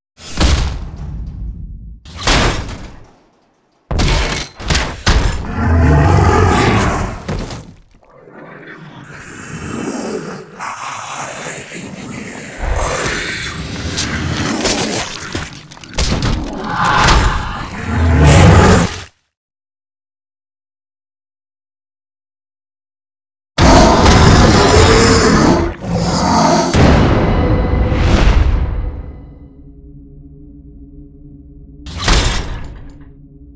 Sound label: hellknight mono; DoomRTX